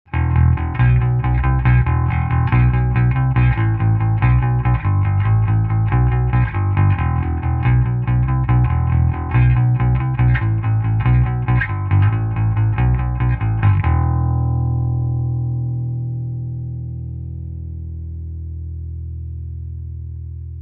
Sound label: Bas U47 panel